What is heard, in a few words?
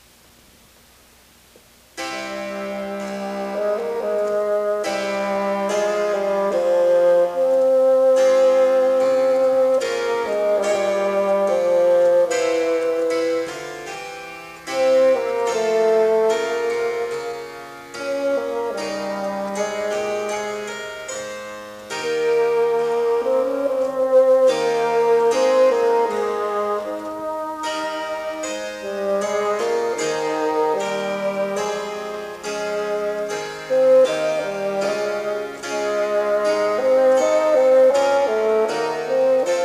Bassoon Sonata; Telemann